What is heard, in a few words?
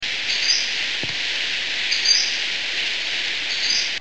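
Western Flycatcher; Pacific-Slope Flycatcher (previously, Western Flycatcher) (Empidonax difficilis); Male: a sharp, lisping pseet? or seest? with rising inflection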